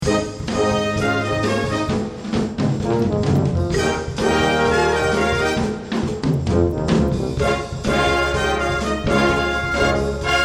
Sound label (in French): lever de rideau; Indicatif court enregistré par la B.F de la Musique Principale de l'Armée de Terre sur CD « Lever de rideau » (Corélia)